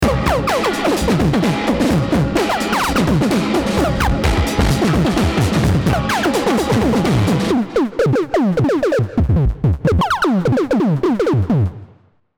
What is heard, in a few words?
drums